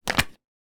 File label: household cell phone back cover remove